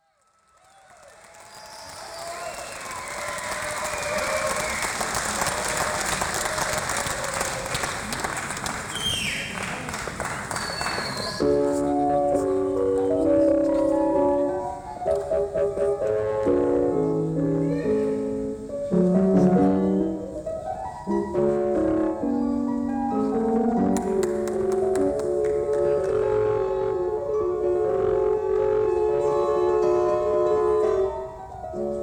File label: Piano Solo